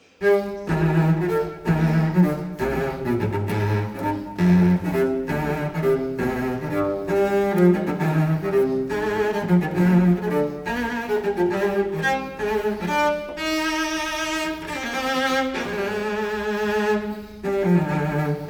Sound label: suite for cello solo no 5 in c minor gigue; johann sebastian bach